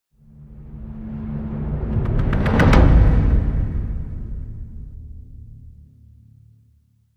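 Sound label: Crescendo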